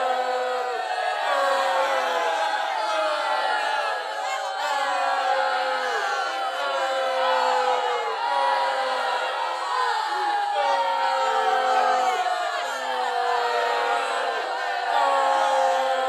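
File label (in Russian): зацикленный файл с освистыванием и шипением толпы общие крики первоначально использовавш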